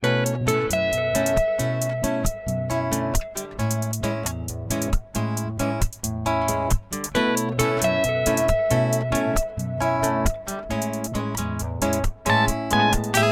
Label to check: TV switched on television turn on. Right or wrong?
Wrong